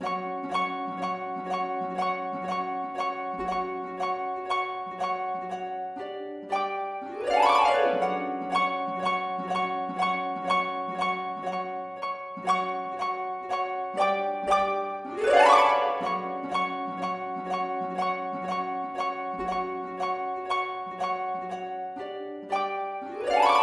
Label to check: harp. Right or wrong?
Right